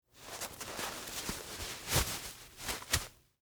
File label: Winter Gloves On, Put, On, Right; Foley, Winter, Gloves; Dressing; Human